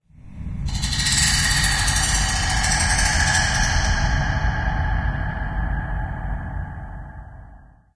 amb click stick, ambient organic; source engine; portal2

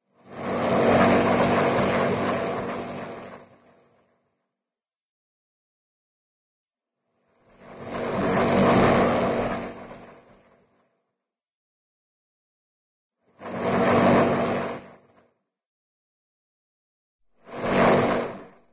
sci fi scooter pass by slow; cartoon; aquaman scooter

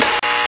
button; buttons; source engine; hl1; video games

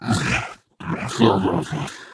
ballbot impatient

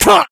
been shot, infected; npc; Left 4 Dead 2; Source Engine

